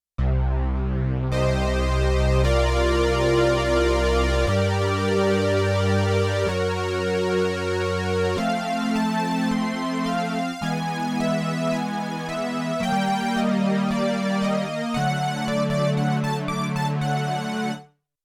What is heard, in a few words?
Filtered Prophet; Yamaha TX16W